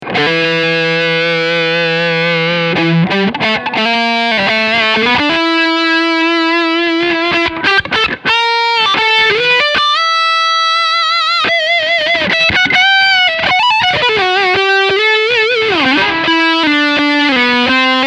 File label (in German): Leadsound Steg PU